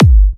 G, kicks; markus hakala kicks

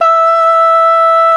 VOX, Male Scats

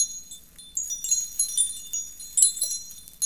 CHIMES, JAPANESE PERCUSSION; AKAI S6000 CD-ROM - Volume 5; Ethic

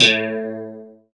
GUI CLEAN, E-Guitar

